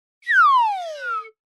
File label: slide down